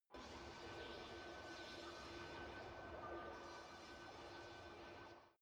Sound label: space alien fabric sawing machine far away